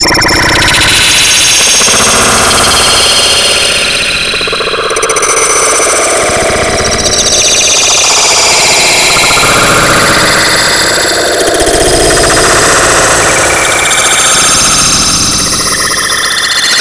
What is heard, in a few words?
Space Noise Echo